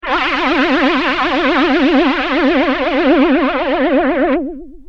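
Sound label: guitar Fender flanger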